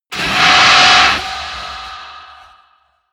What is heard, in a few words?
distortion